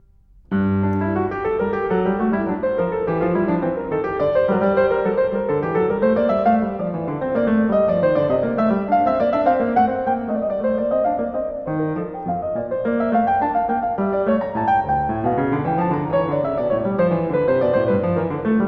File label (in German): Einstimmige Inventionen g-Moll, klavier; Einstimmige Inventionen XI) g-Moll; BWV 782; BACH; Inventions